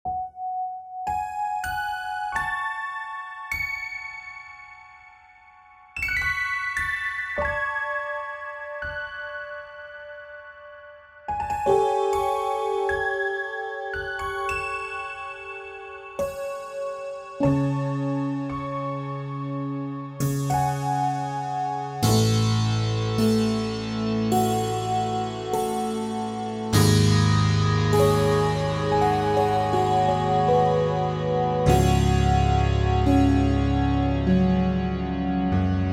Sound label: slow dream